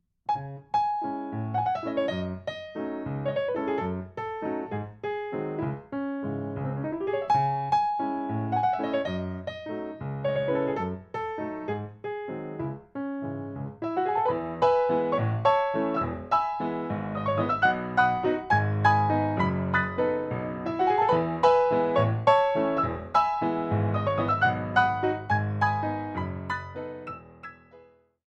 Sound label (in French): Ballabile